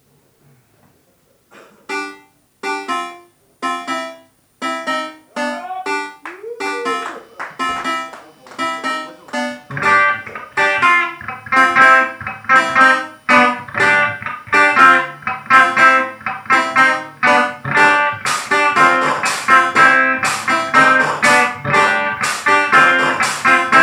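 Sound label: gameboy with electric guitar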